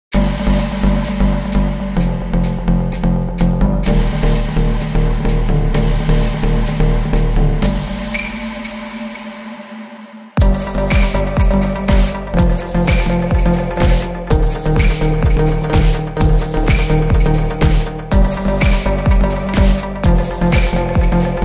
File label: Melodic House